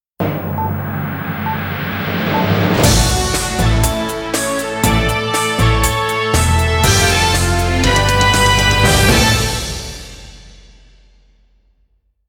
news time intro

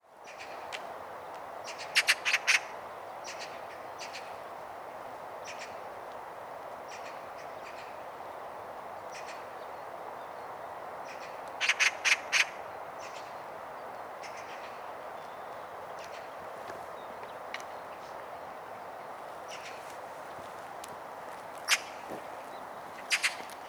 Oriental magpie

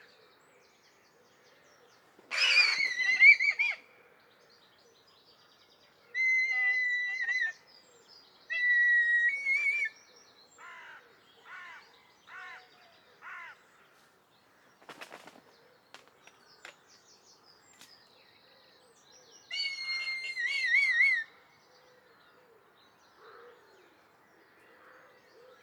Red kite; پرنده کورکور حنایی

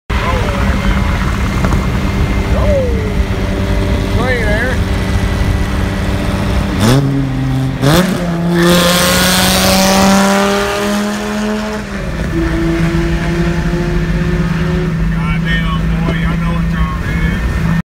Dodge Viper

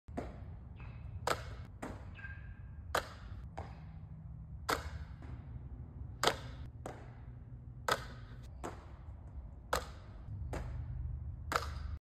Basketball hoop

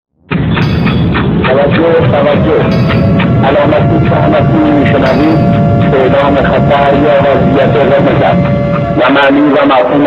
sirens, آژیر خطر جنگ; Iran; Iraq

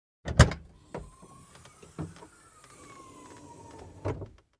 Car trunk; باز شدن; صندوق عقب ماشین

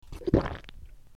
heavy swallow

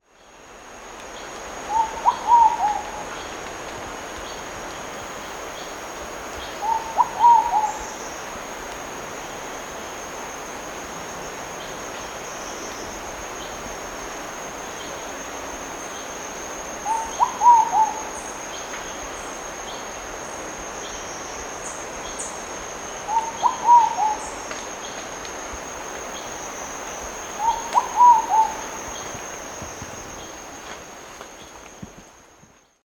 Ruddy pigeon; کبوتر گلگون

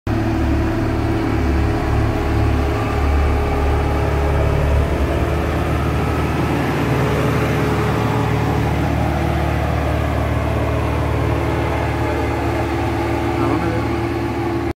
Road roller

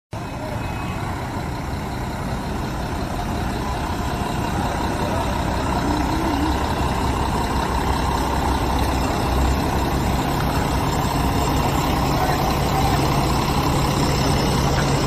Benz bus

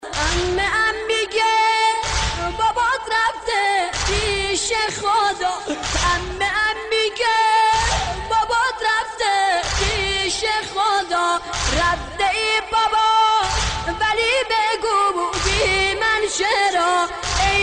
Islamic ringtone

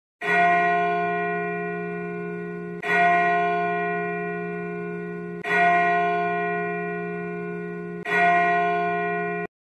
big bell

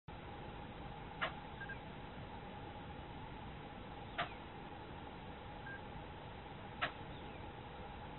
Himalayan goral

